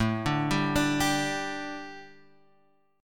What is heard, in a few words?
A 7th Suspended 4th, A, down